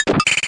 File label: vase break; N64; YOSHISISLAND